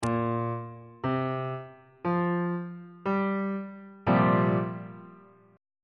b sharp dominant 7th suspended 4th 3rd inversion on bass clef